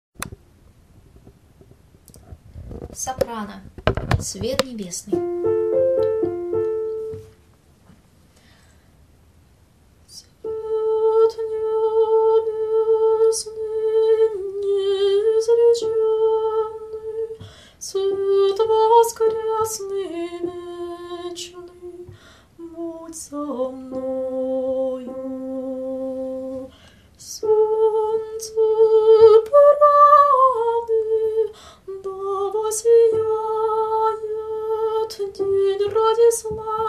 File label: soprano; Svet nebesnyj